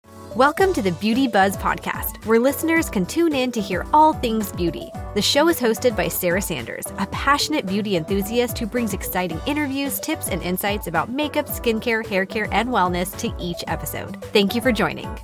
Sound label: Podcast Intro Outro music; Beauty Buzz